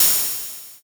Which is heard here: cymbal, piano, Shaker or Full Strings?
cymbal